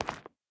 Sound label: rock footstep